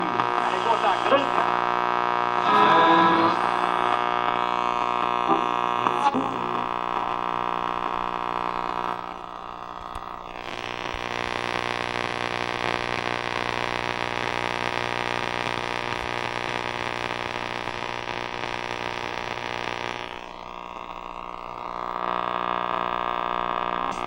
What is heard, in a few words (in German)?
Radio Brummen; Fieldrecordings; Brasilien